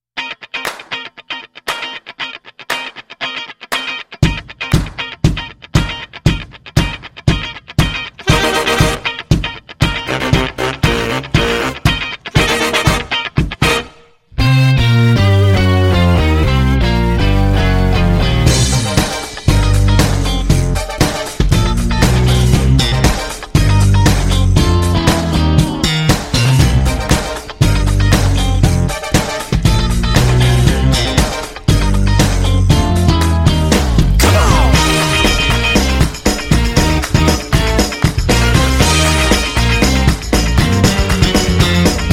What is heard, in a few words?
Funk Nation Vocal